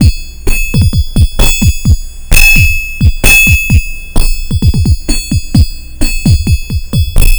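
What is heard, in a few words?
Messup Loop, beats; Sonic Foundry (Sony Creative Software); Esoterik Beatz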